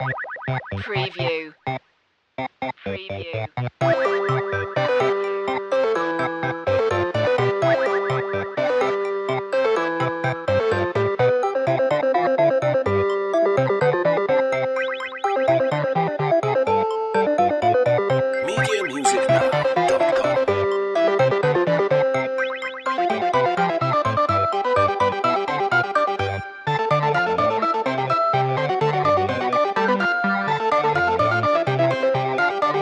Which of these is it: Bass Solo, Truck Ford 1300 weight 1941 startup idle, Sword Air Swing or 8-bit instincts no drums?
8-bit instincts no drums